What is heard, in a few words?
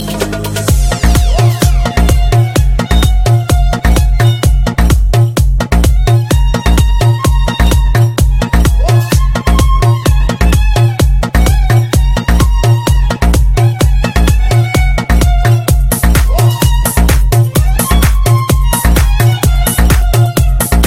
arabic mix